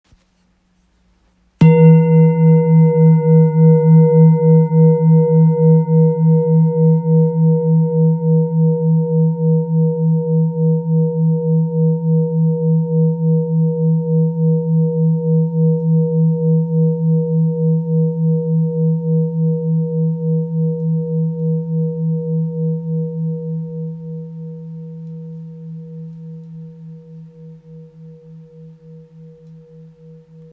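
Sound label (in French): Bol thick mi, bols chantants tibétains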